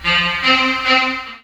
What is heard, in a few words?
SAX FX; USB Soundscan vol.27